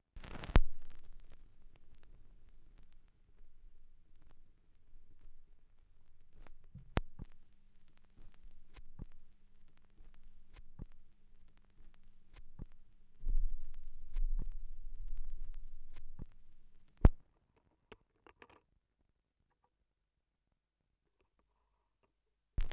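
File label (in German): schallplattengeraeusche, record noise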